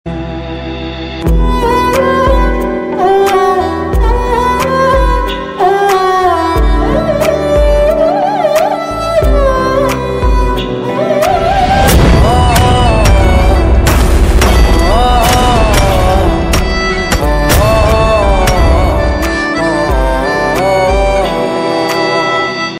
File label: sad instrumental ringtone